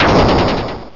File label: Anchor Shot; pokerogue; battle anims